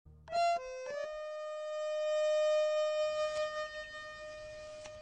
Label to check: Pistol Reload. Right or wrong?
Wrong